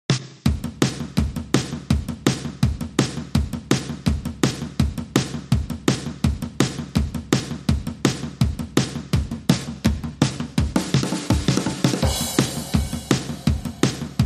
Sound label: Drums Only